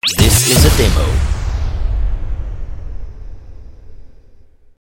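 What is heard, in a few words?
Swoosh Crash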